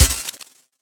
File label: spark; cybermachine